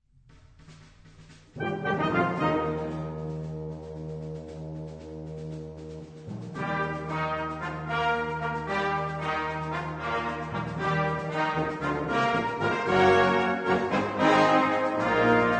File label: Fanfare and Hymne